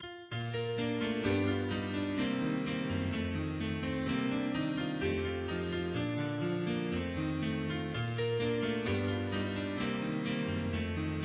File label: kb synth